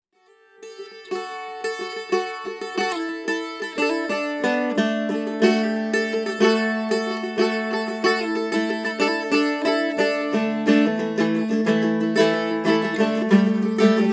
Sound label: mandola zebrawood; alden